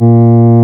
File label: REED A#; Big Fish Audio; Synclav FM